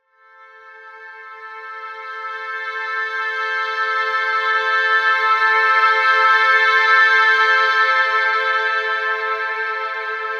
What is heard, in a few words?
Oboe Am 70Bpm; Construction Kits; STEMS; Ghosthack; SkyRealm; Kit 20 - Sea Of Souls